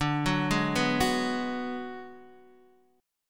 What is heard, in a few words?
D 6th Flat 5th, Guitar Open D